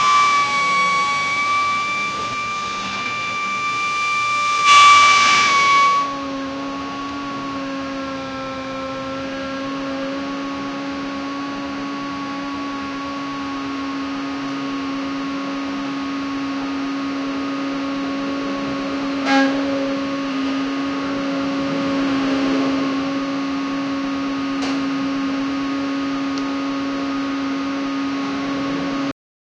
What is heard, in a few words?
electric reach truck driv, warehouse